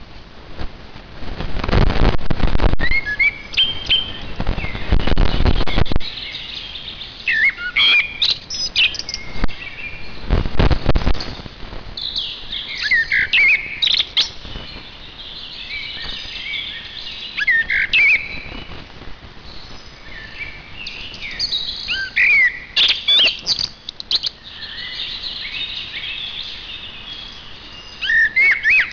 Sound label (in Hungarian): feketerig